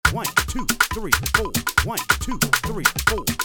african praise loop 139 bpm, uptempo african praise loop with talking drums